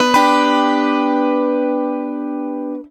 Ac 12 Str Gtr 109bpm G end, Twelve String Guitar, African Guitars; Quazibeat; African Secret